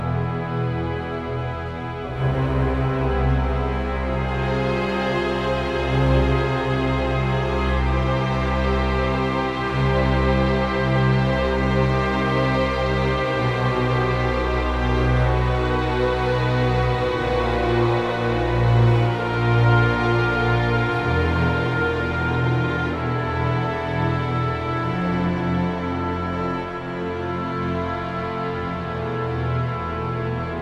LOADING; brothers in arms earned in blood

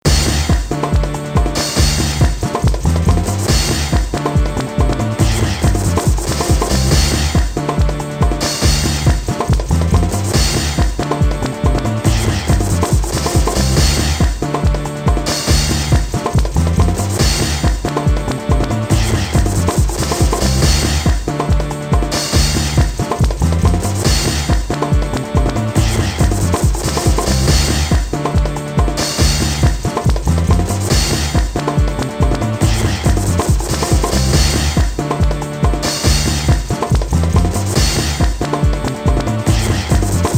Hip Hop; Disney's Magic Artist Deluxe; Goofy

